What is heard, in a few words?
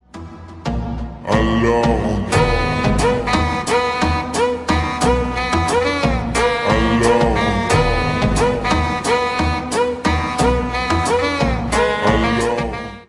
slowed reverb